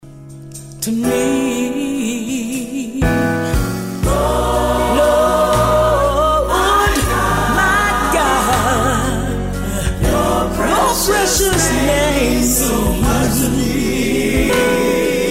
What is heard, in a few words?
vocal